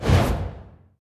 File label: ui rewards disappear